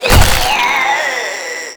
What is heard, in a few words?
Inked Humanoid Death; Enemies; Warframe; Shadowgrapher